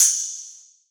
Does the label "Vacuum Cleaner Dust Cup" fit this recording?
No